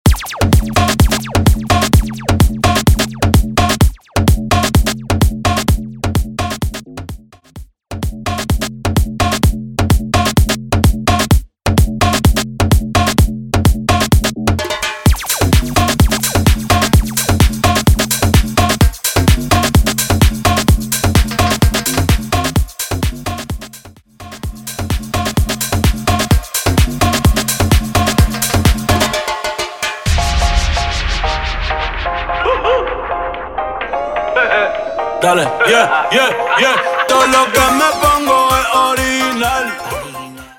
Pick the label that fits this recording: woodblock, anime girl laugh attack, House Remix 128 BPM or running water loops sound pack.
House Remix 128 BPM